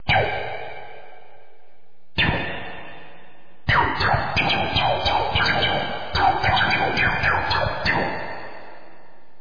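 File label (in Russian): синтезатор эффект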